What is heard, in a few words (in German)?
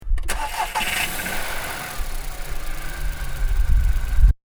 Motorstart